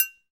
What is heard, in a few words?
triangle, percussion